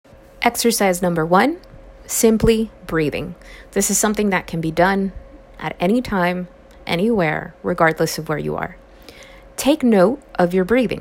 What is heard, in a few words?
Simple Breathing exercise